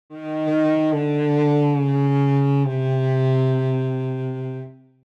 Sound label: Cartoon fail